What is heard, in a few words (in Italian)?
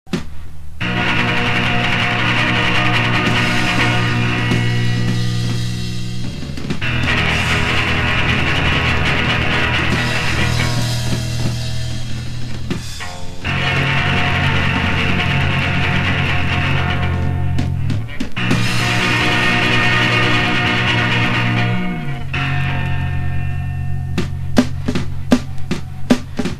intervallo